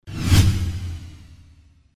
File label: WOOSH